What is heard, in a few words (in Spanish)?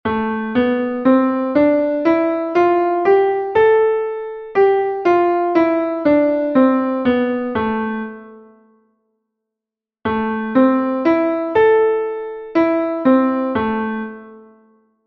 escala arpegio la menor; Escala e arpexio; Entoación a capella